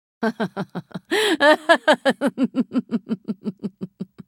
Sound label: laugh; Irelia